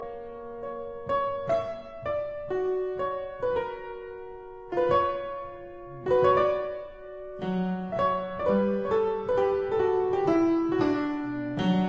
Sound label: Keyboard Sonata in B minor Largo mesto e patetico Allegro Tempo primo; Op 40 No 2